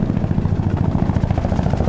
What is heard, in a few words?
Gunship Run Loop; Civ4 beyond sword